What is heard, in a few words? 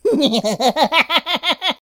cluwne laugh; Voice